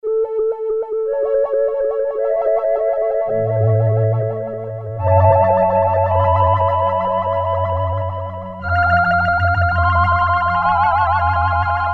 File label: Lunar Flutes, Korg Poly-61M